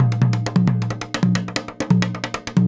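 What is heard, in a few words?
junjunba groove; Best Service; World Colours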